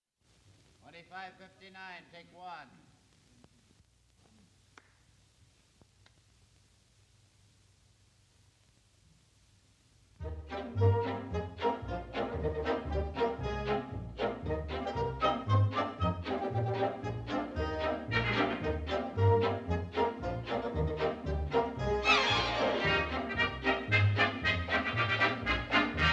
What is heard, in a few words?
Orch Only Beginning